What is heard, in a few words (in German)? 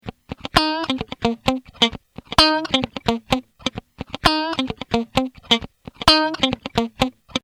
Funky Git übersteuert